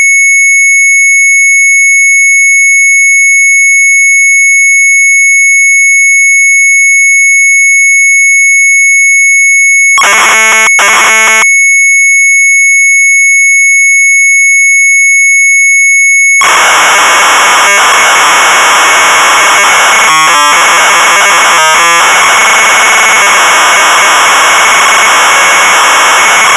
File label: MZ800 Tape, sharp; MIRA; retromelsarchive